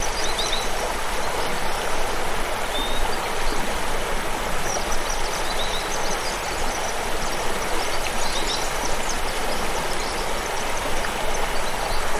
River Mvmt On Left

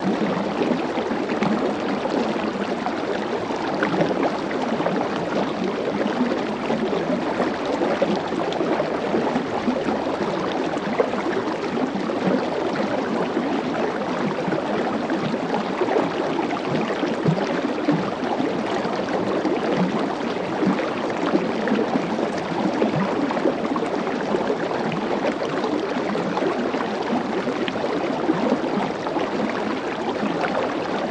River Sml Med Fst Smoo

